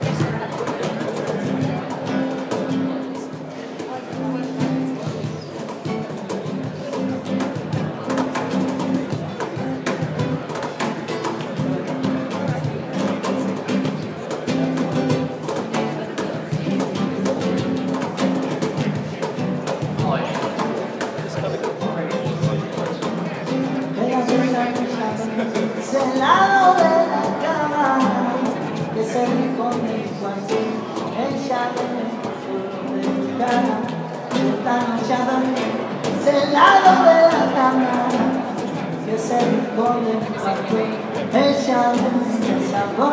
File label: Spanish music played for the audience